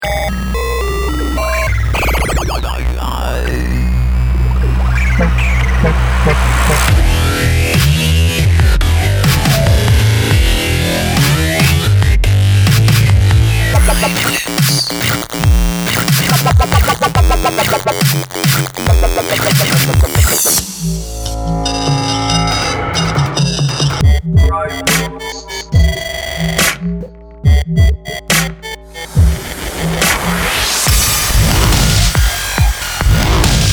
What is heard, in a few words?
8Bitstep